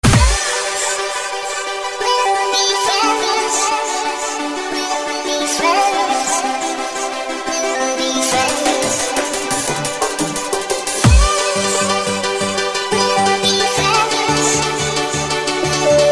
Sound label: drum n bass mix